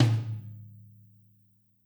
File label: tom